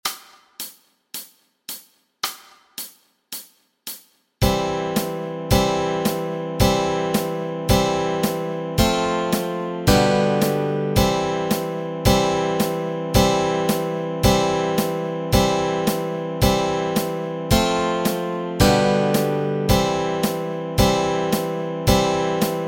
chords

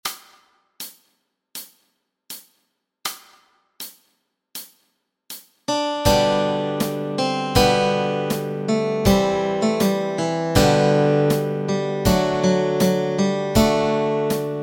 Alive Alive melody and chords

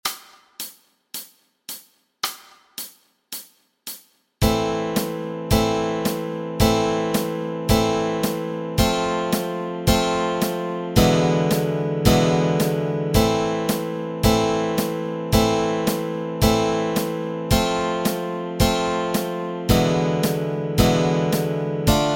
chords, Easy Guitar and Ukulele

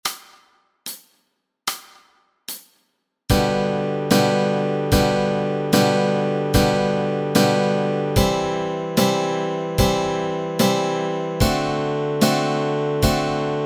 Jingle Bells chords, Guitar and Ukulele

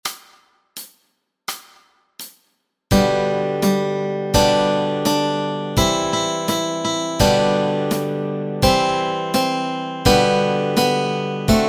Baa Baa Black Sheep Melody and Chords Track

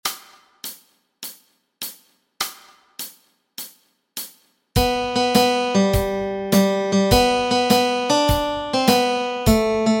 melody

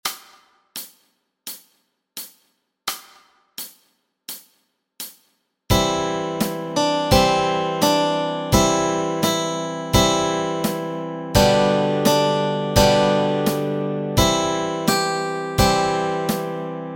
Melody and Chords Track

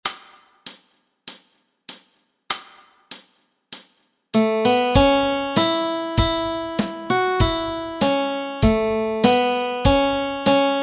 melody

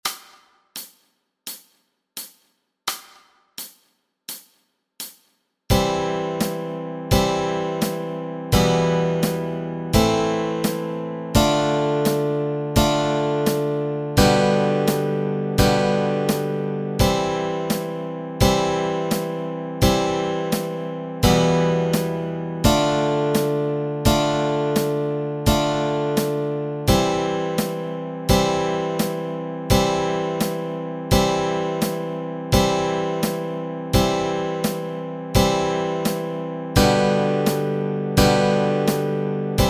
chords, Easy Guitar and Ukulele

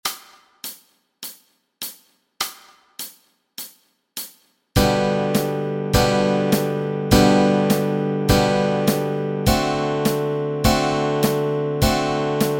chords, Easy Guitar and Ukulele

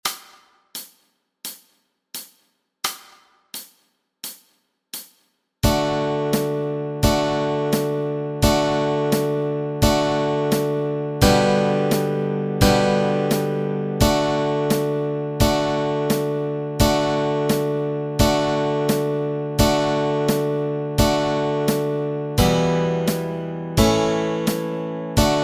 chords

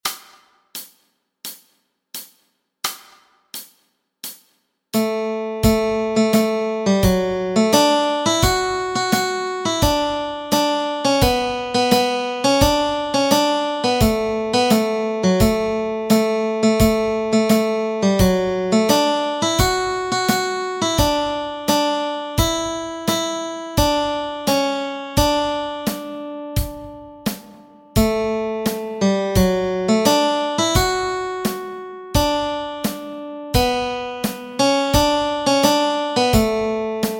melody

